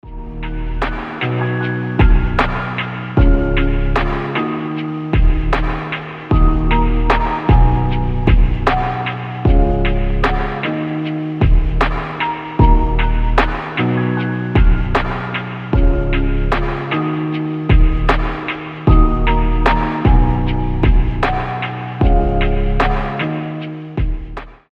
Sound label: slowed reverb